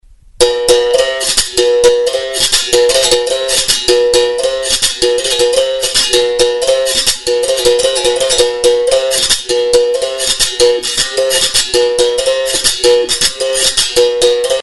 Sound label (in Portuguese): toque são bento de bimba, capoeira